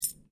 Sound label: COIN PENNY SINGLE DROP HAND; Common coins; Money Sound Effects